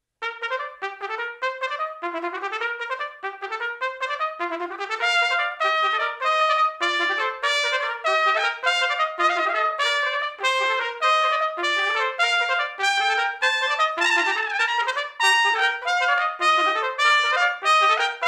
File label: Trumpet Duet